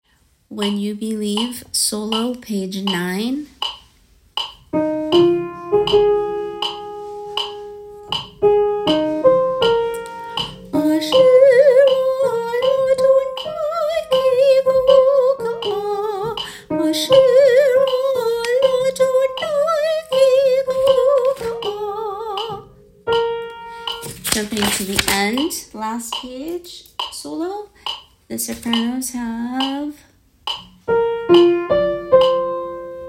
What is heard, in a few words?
opening and closing solo; Broadway Shabbat